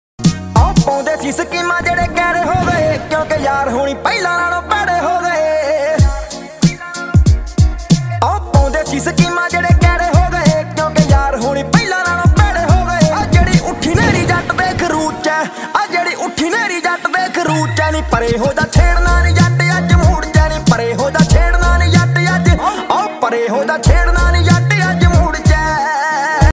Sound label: DJ Punjabi Ringtone